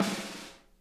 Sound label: snare, drum kits; Sonic Reality; Interactive Drum Kits